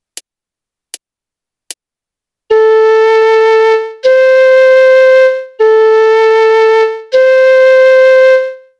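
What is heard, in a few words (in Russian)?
lya do, flejta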